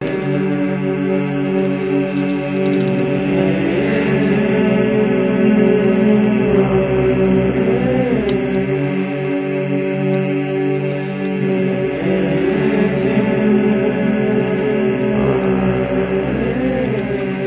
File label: 110 mmm mmm vocal; REMIXABLE COLLECTIONS; Folksy Guitar and Voice Stems